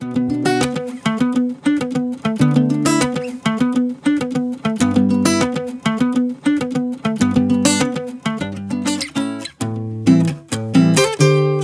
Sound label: Contemporary Pop Guitar; Sample Pack Demo